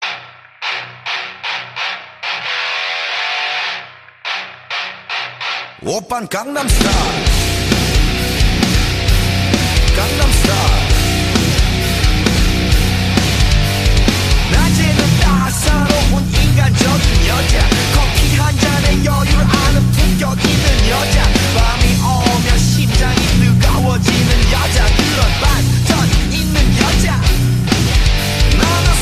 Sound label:  metal